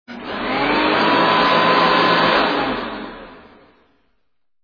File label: Vacuum Quick; bodilies